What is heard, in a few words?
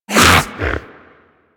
Predator Vocal Tranq Dart Grunt; Warframe; Hunting Critters